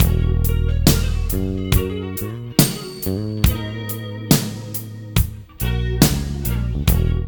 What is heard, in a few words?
MIX, Funky Ass Loops; East West